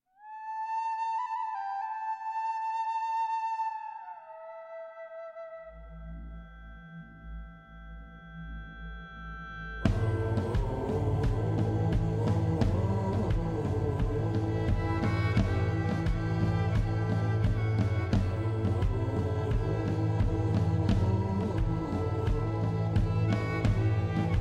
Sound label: menu; Medieval Fighting Games; android game music